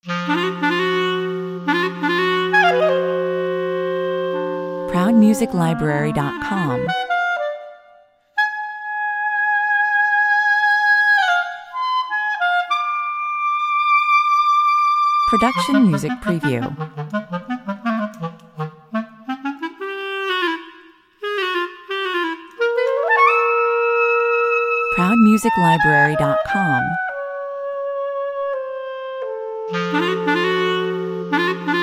clarinet

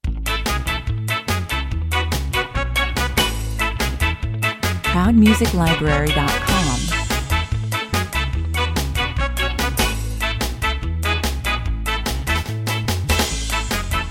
ska, 145 bpm

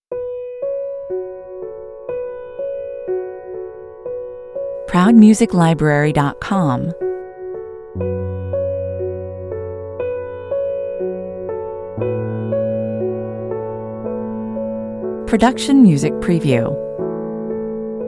piano only

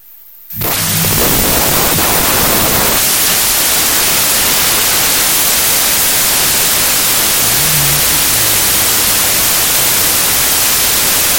sci fi main theme 106 bpm

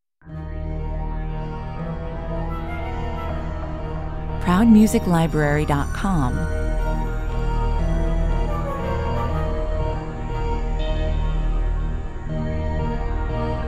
gruesome circus 120 bpm

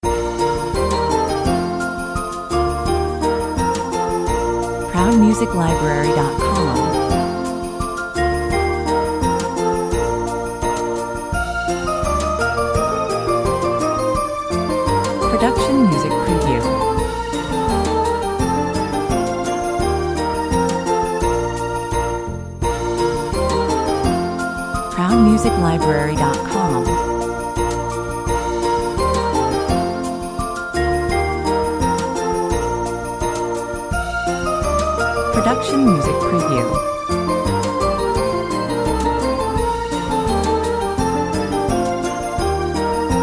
ding dong merrily on high 85 bpm

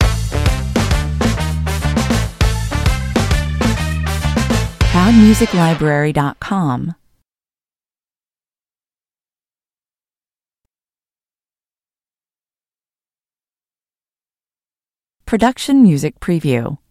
the funk stinger

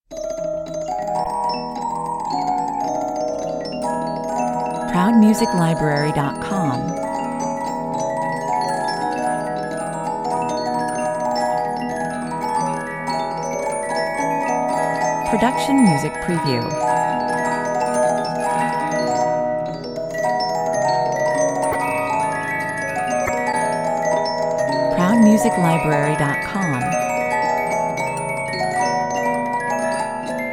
music box theme

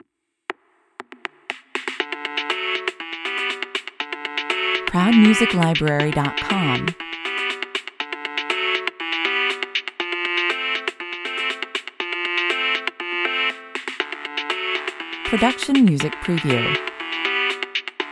casio

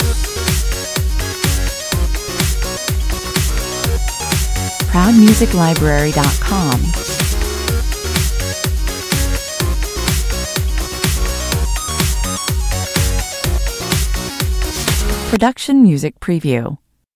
pop loop

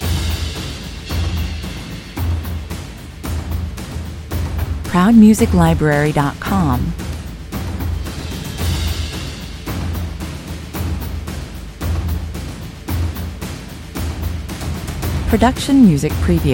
percussion mix

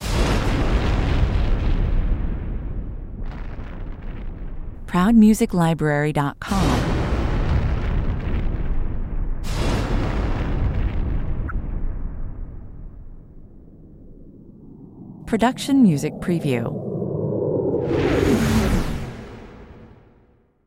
storm burst

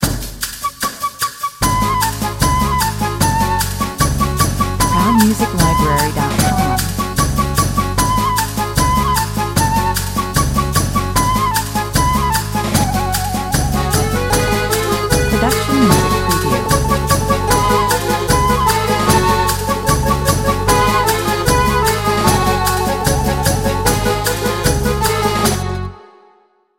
tarantella 76 bpm

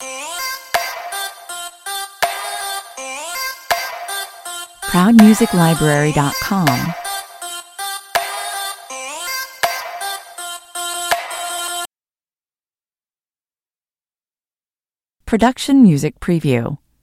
trap this (loop)

